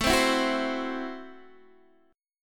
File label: A Minor 6th, Guitar DADGAD